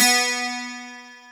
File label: 12STR, PIANO; AKAI S-Series CD-ROM Sound Library VOL-8